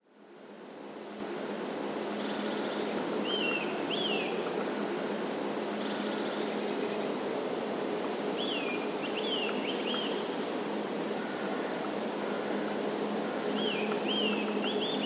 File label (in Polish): kowalik, nawoływanie i "nieśmiały" śpiew kowalika; Kowalik zaprezentował swój bardzo krótki program o wschodzie słońca